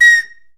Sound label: SIKU A#5, The Andes